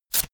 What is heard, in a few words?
velcro large open fast